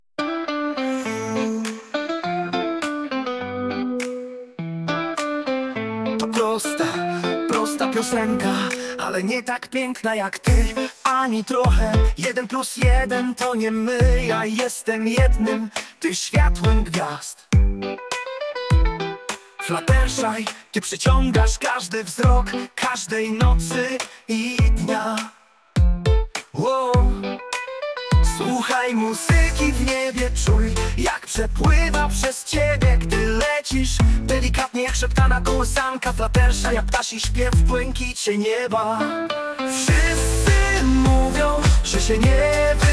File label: Birdsong; fluttershy